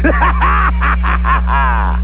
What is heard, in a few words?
neg laugh